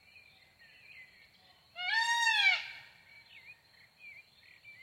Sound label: Pavo cristatus